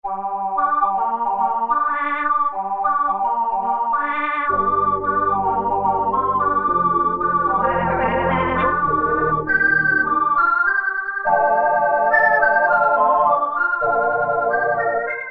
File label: Vocorder, Roland Alpha Juno-2; Factory B